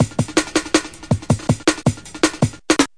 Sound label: 161 rolling, drum loops and breaks; 161 to 180 bpm